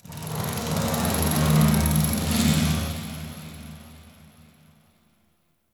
Corpus Ship Metal Movement Texture Large; Textures

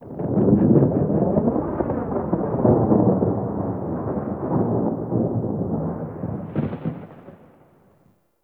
Thunder One Off; Ambience; Warframe; Sacrifice; Vitruvian Memory

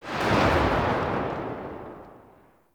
Tail B, Weapons; Warframe; Corpus; Tenet Quanta

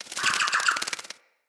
flock bird attack dive; Characters; Enemy; Abilities; Sinecure; Ranged Hunter Thawtide

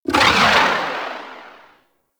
Artillery Barrage Explosion Far; Archwing

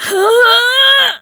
Laugh; Kuva Lich; Persona B; Ability Lift

